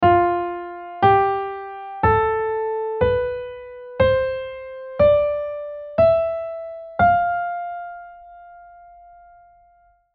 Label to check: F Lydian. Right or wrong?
Right